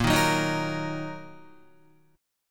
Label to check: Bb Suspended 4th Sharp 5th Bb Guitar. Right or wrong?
Right